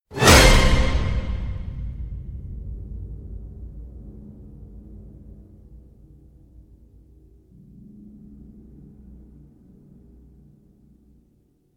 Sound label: smack